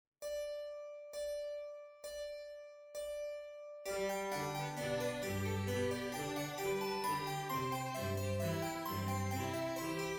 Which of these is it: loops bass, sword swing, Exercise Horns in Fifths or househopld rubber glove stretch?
Exercise Horns in Fifths